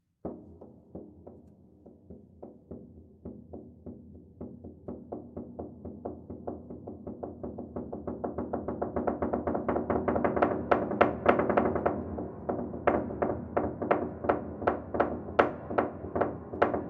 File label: Chaos Knocks